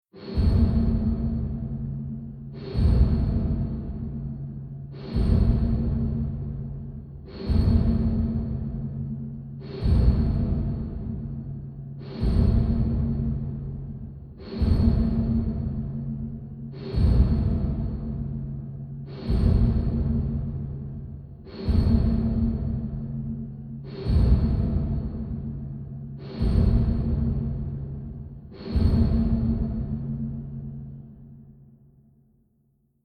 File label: Robot Footsteps SciFi Metallic Very Large; Robot Metallic Footsteps Very Large Impacts Scifi Science Fiction; Robots; Robots (Sound Effects:Science Fiction)